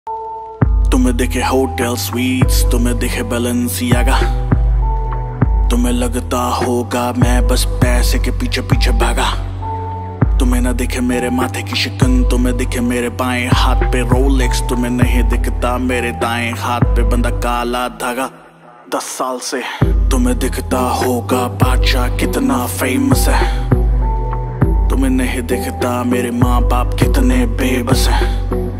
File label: hindi rap ringtone